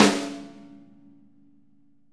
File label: snare, Interactive Drum Kits; Sonic Reality